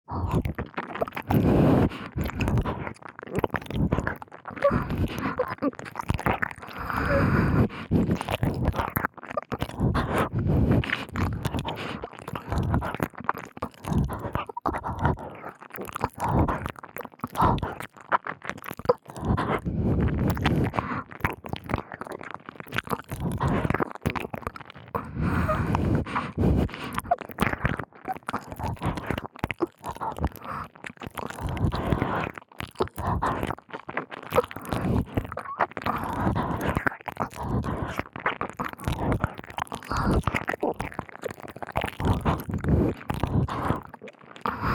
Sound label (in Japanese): 激しい耳舐め, 効果音無し; KU100